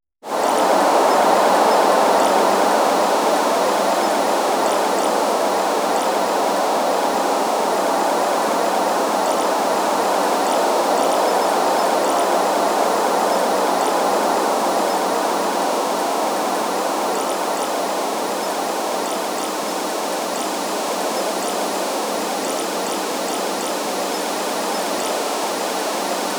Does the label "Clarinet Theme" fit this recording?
No